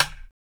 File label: XTRA PERC